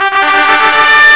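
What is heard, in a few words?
knife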